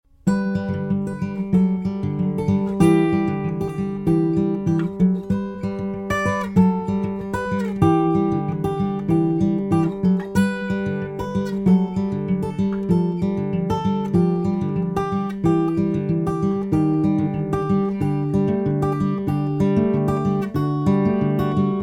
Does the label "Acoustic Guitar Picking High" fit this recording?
Yes